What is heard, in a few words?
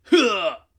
Grunt; Nailmaster